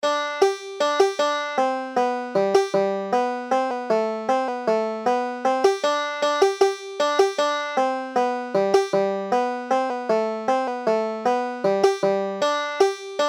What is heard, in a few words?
banjo